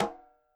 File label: CL op h 1 L, KILLER CONGA, Roland MV8800